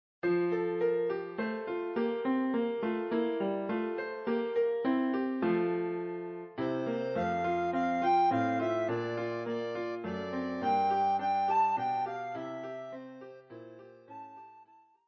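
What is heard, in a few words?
We wish you a Merry Christmas, soprano recorder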